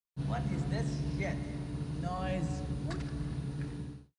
Vocal (Noise)

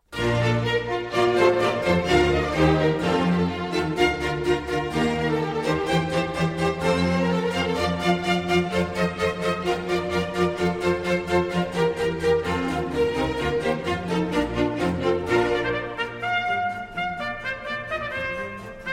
Oboe Concerto in B-Flat Major, Allegro; Oboe Concerto No. 1 in B-Flat Major, HWV 301; II. Allegro

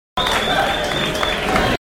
Crowd

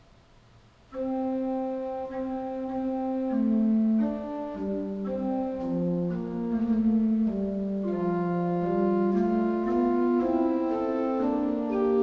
Fugue, Organ; Magnificat sexti toni; Johann Pachelbel; Complete Organ Works

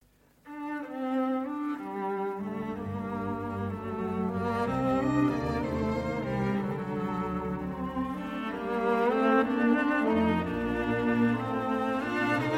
Pièces en Concert for Cello & Strings Sicilienne; Arr. Paul Bazelaire

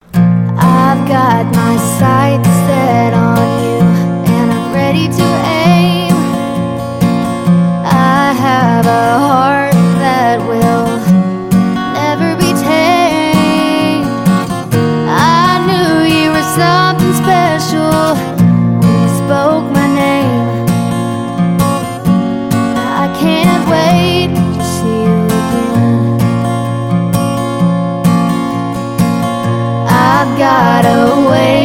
Acoustic Version

